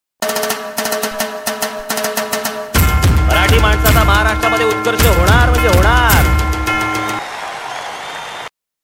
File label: with dhol; Raj Thakare